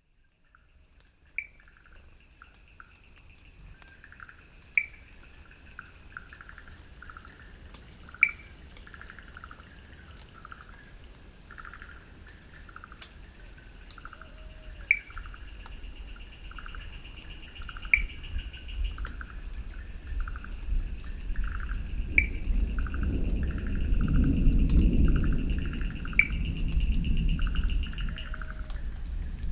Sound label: Tropical Forests; BOOM Library